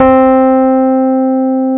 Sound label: C 2, Electric Pianos; Wizoo